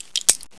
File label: stilletto draw; knife; weapons; cstrike; csgo